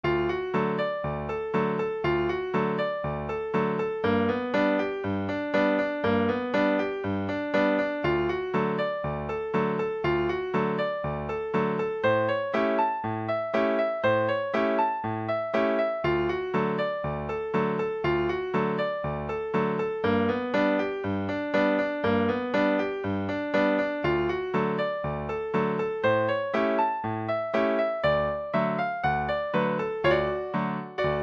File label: fiddle rag